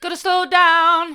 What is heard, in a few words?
gotta slow down hard, vocal